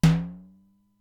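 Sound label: Simmons SDS-9 high tom, Drum Machines; Factory D3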